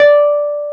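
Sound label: Wurlitzer D5, Keyboards Old School; USB Soundscan vol.09 - Keyboards Old School; AKAI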